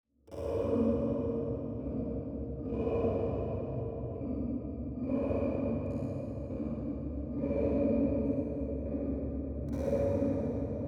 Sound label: breathing